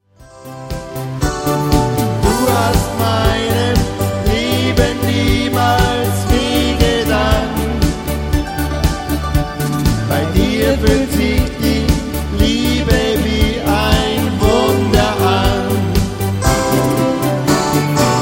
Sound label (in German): Duett